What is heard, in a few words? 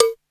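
COWBELL; 90 sSampleCDs; Crypton - DrumBase5000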